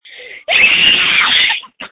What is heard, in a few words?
screams